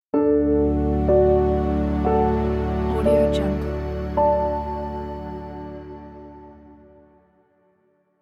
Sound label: calm piano logo